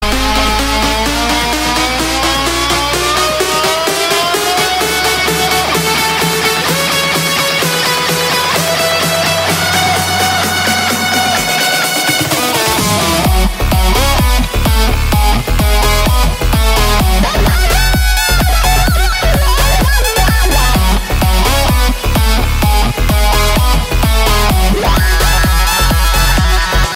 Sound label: electric guitar ringtone